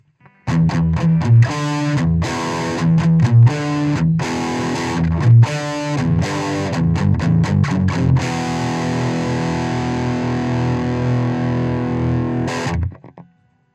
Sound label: lag vox gain12